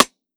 drum hit normal; hitsounds; kodama - nogi

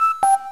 menu back; [(ULTRA PRIVATE SKIN UR PC WILL GET DMCA'D)] (coven + morgan, Remake by Nymphia)

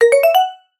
match confirm; If there was an endpoint

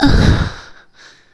combobreak; Katou Megumi by iEddie

